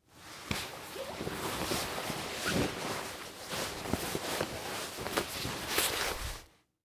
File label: Coat Rustle Leather; Foley